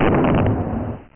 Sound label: EXPLODE